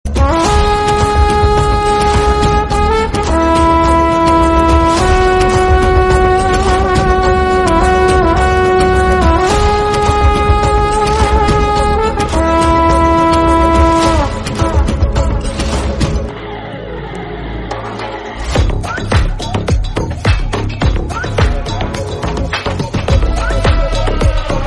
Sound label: bgm instrumental; jailer